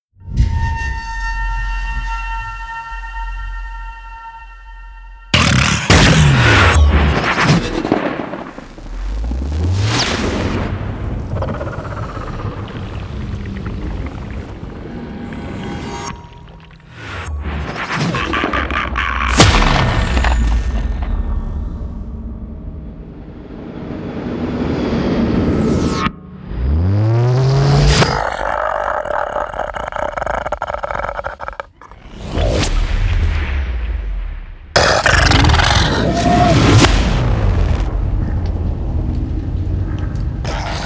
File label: Halloween Horror Sound Design